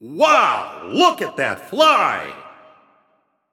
Long Hit; H0wRd C0Sine Driftball

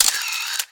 Camera Shutter

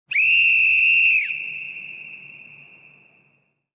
Referee Whistle; Announcer Scoreboard; Sports

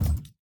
Hand Paddle Attack Light

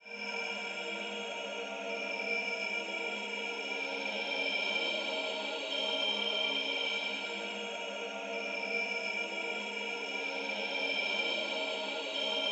Quest Kiosk Loop; Quests

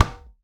Tennis Racket Ball Hit Hard

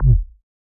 Ball Collision Forcefield Extra Light

